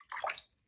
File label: water